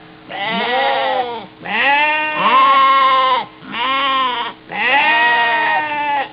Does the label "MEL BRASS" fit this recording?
No